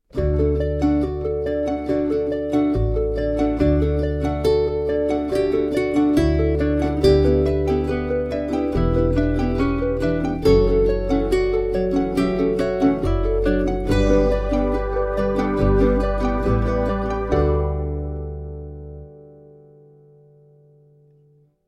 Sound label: Calm Upbeat